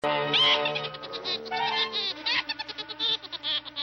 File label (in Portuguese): a melhor risada do pica pau woody woodpecker; Risadapicapau